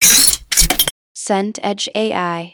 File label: bottle cap twist